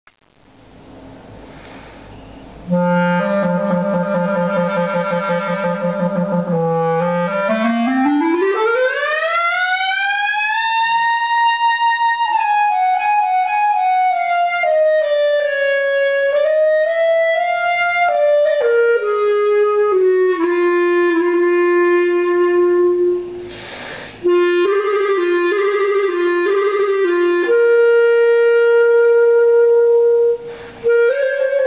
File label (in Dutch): opname klarinet